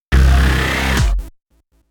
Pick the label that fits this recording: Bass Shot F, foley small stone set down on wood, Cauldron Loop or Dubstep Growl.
Dubstep Growl